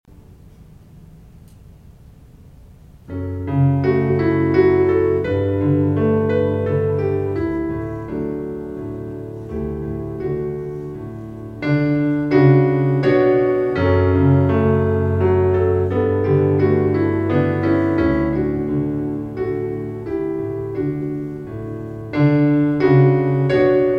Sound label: piano